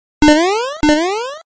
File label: iihaa Bounce